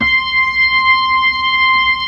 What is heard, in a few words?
B-3